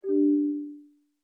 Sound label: back select; uisounds; fromashes